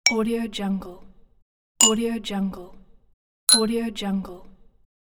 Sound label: glass clink; minimal studio